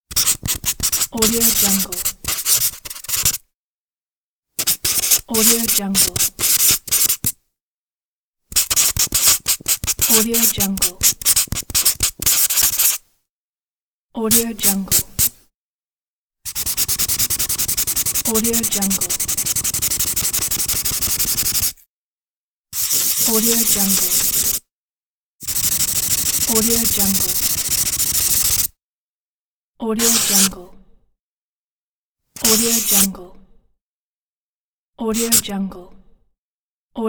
marker pen

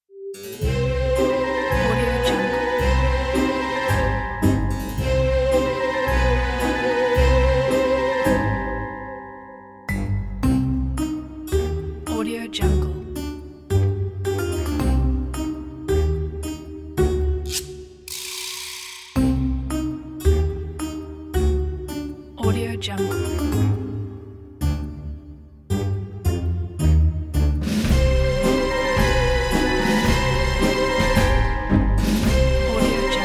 halloween creatures marching; thatbeat

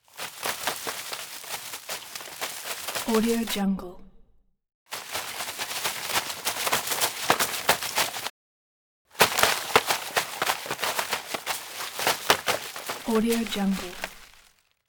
footsteps brush

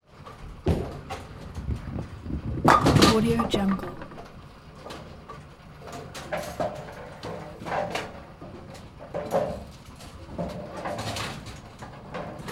int bowling lane hard shot; collanderson

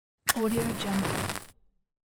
safety match

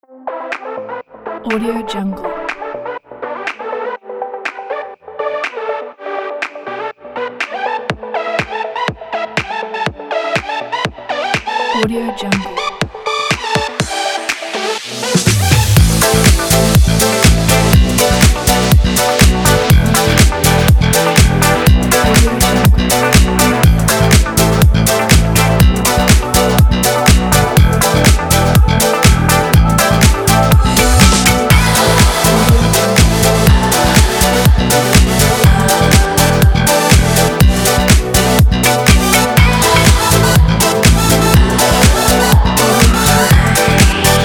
the disco kit

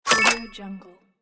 purchase complete

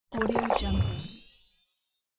health potion drink

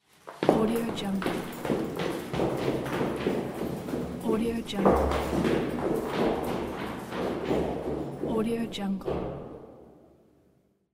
go down the stairs

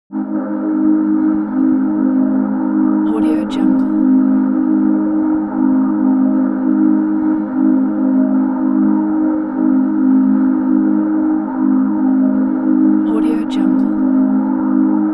atmo pads under water

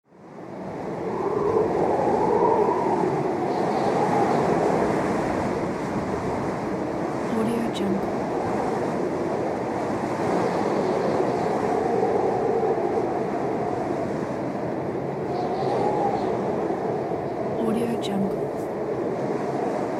winter wind

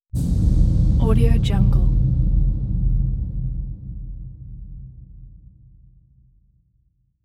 distant impact with airy tail; hollywoodedge

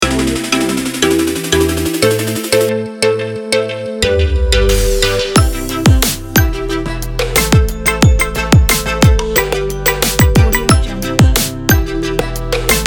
future bass logo; musicplugin

